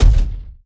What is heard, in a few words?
Climb Base; Evolve; Goliath